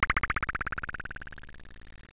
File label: collide; practice